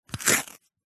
foley card toilet roll rip small section

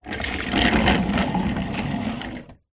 radiator portable wheels roll wood floor; household

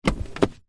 car clutch pedal press down with foot engine off; vehicles; zapsplat

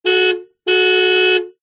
vehicles car hyundai trajet horn beep x2 external; 2006

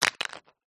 cuttlebone drop ground; zapsplat

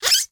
cartoon squeeze squeak

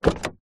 car door open ext; vehicles

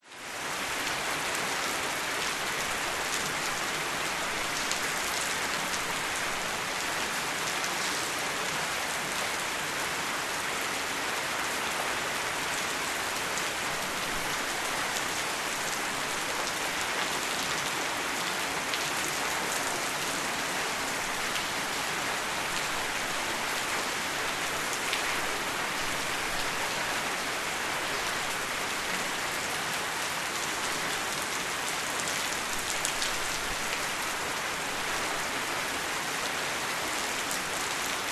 rain soft vietnam

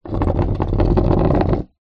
sport surfboard scrape drag concrete ground fins

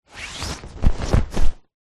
foley parachute small deploy open; zapsplat

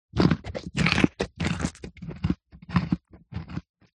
animals horse eat carrot chew munch; zapsplat

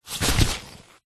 foley umbrella closed catch or set down; zapsplat

